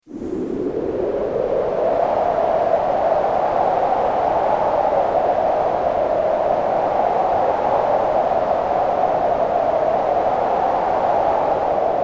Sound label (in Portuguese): vento do deserto efeito sonoro